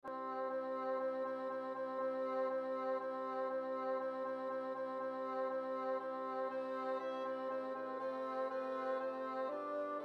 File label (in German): Zum Tanze Tenor langsam